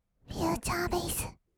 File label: future bass; 音楽ジャンル; 1200 kawaii voice!!